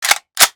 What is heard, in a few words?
Titan Blast Reload